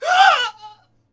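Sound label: Swordsman Die Vox; Civ4 warlords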